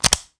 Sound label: Screen Locked mono; i OS; iOS10; SpringBoard